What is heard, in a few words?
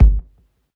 KICK, electro kit; JUNGLE BEATS